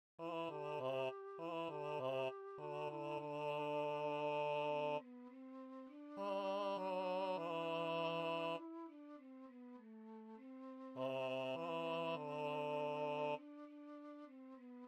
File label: Chorus Bass